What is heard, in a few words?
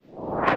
dash prepare; flamebearer; Hollow Knight